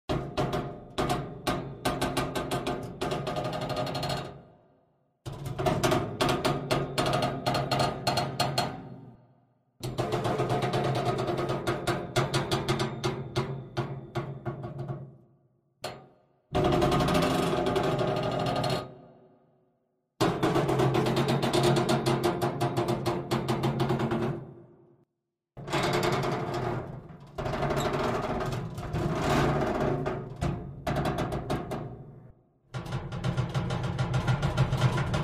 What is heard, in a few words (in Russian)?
skrejet metalla